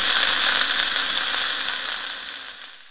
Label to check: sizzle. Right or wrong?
Right